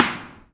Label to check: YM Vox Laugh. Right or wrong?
Wrong